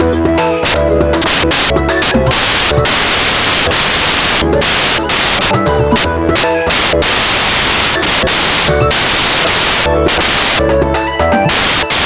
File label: pop, bgm; pictcode